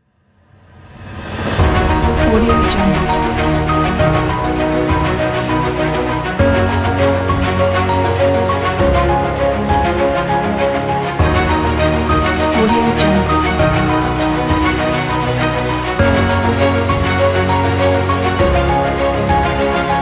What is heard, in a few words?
epic inspiring cinematic pack